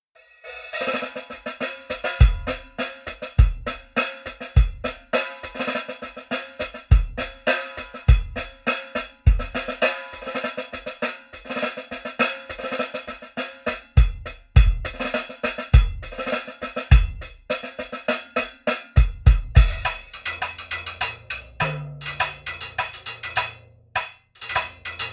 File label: jar lid (drums only); tascam; montineri